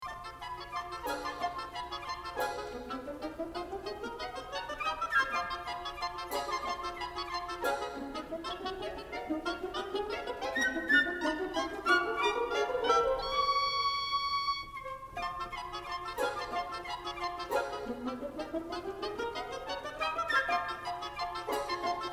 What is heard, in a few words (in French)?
orchestre; les tableaux